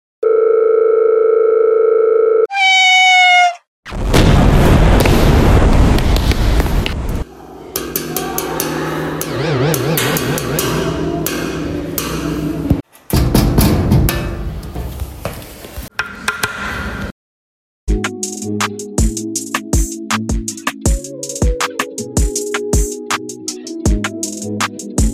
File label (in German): Sounds of Sachenbrunn